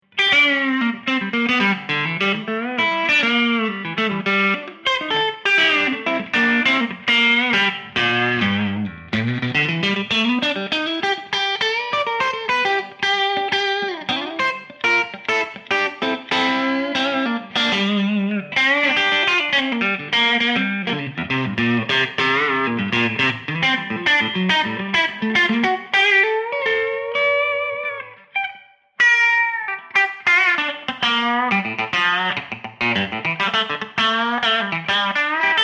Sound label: Traditional Clean Country; kinman